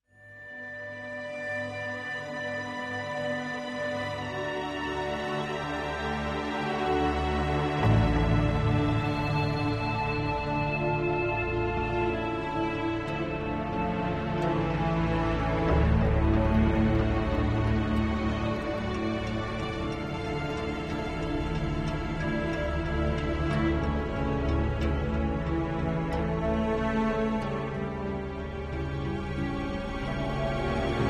Loop Neutral; tales from the borderlands gamerip 2015

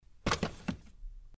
Foley pick up item

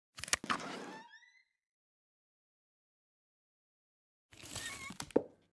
Box Empty

